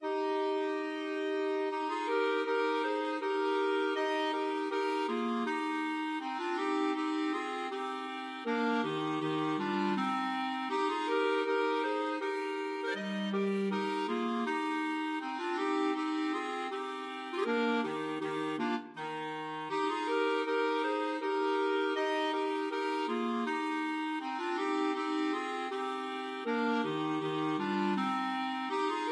slavonic clarinet

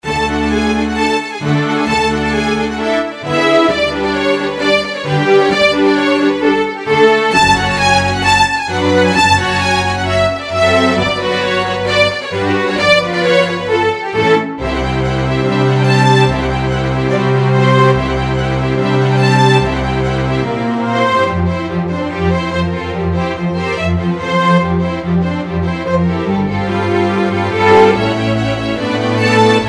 romanian folkdance, string ensemble; bartok

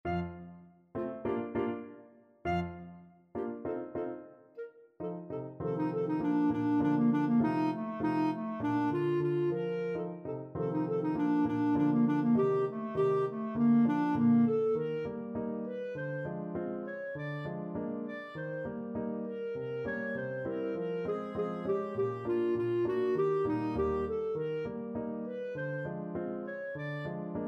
pizzicato polka, clarinet

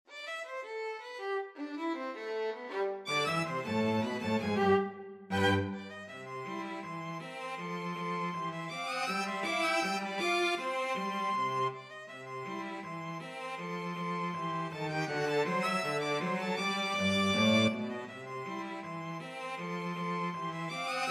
the entertainer 2 violins cello

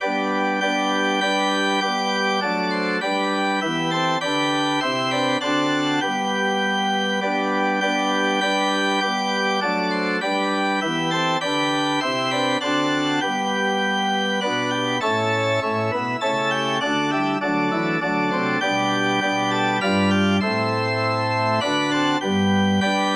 organ

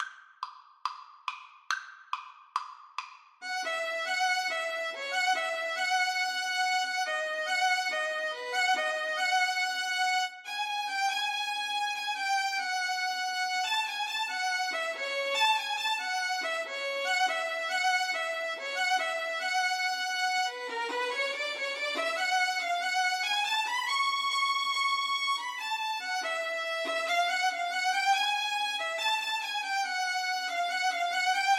violin duet

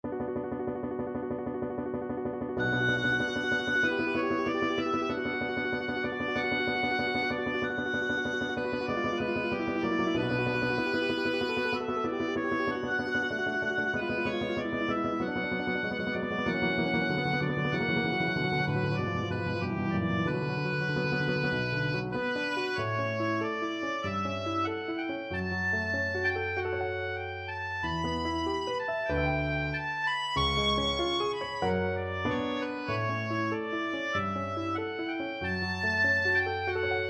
scene finale, oboe

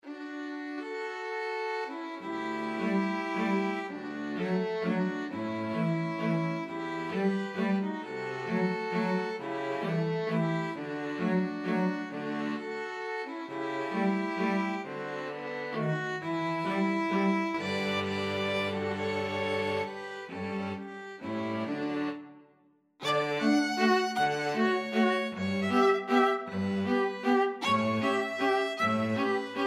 waltz, string quartet; emperor

